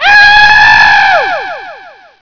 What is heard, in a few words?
Scream